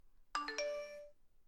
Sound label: Alert